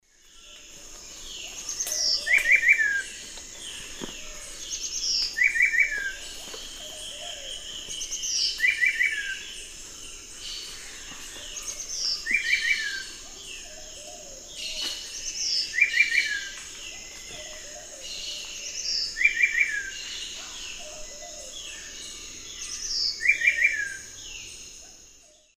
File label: Henicorhina leucosticta, song; S. America; Eastern Lowlands